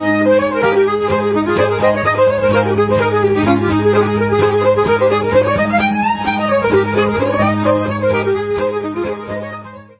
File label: accordion